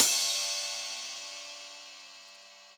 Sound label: open hh; eddyflux